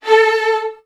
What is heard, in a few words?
VN 16TH, 3A2; ILIO; Kirk Hunter